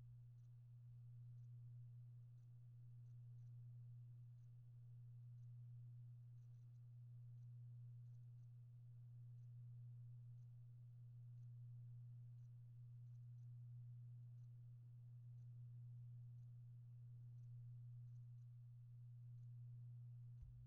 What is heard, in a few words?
Roomtone